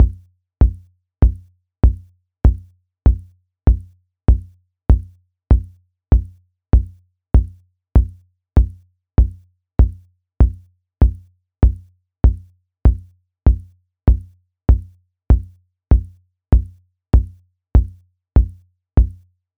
delay kicks cr78 plate fifth wet; aas; objeq delay

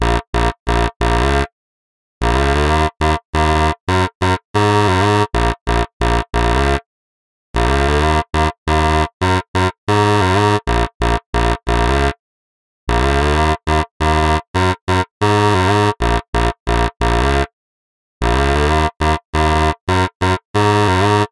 delay modulators cello wet; aas; objeq delay

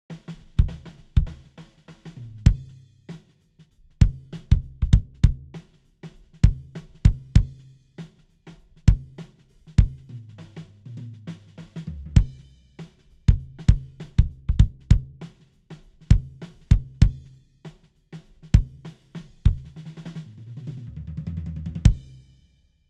Drum KICK; 1073OPX

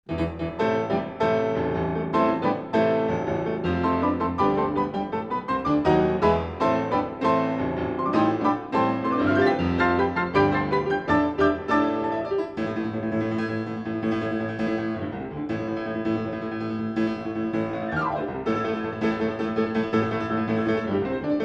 agitato Off Mic; QTC40; スタジオ、リモート・レコーディング用途に高い人気を博すQTCシリーズのスタンダード・モデル

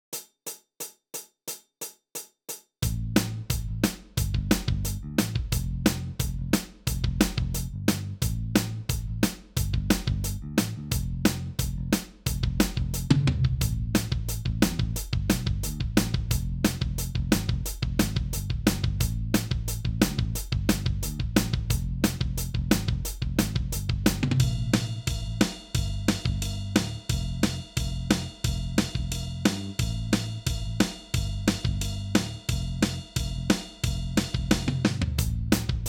Smart Jam Backing Track; spark Mini

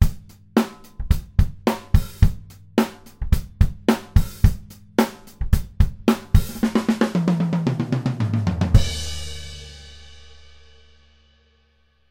QTC40 as Drum Overheads; earthworks; QTC40 ドラム・オーバーヘッド; QTCシリーズのスタンダード・モデル

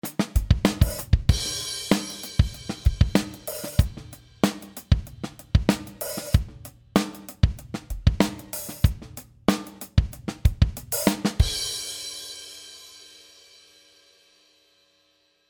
Heavy Metal; One Kit; fxpansion; BFD3

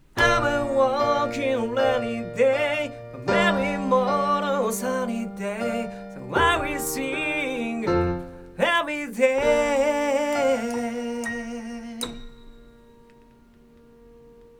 piano XY

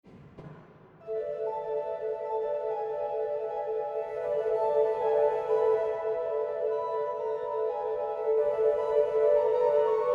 Flutes clairon; Virgile Monin

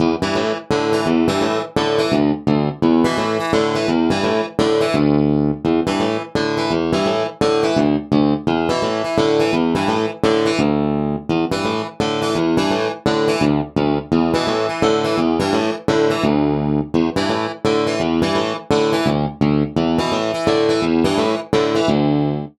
delay modulators moon echo chorus wet; aas; objeq delay

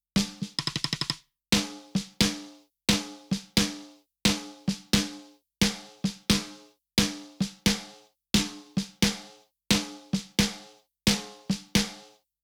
Snare 1073LBEQ After; Neve 1073LBEQ使用後

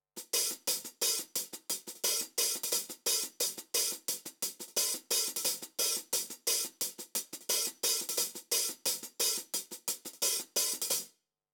Hat 1073LBEQ After; Neve 1073LBEQ使用後

